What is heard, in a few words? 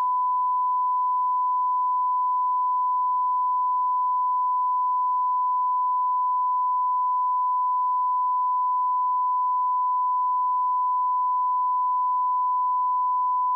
1k Tone